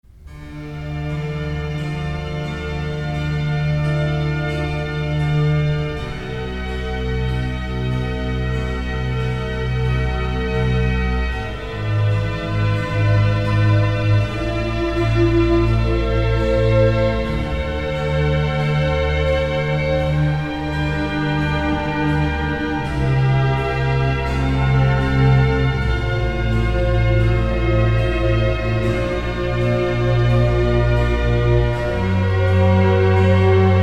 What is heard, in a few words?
concerto Madrigalesco, Adagio; VIVALDI